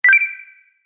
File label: Science fiction Activate Sound